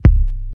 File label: old school steel kick drum sample C key, kickdrum sample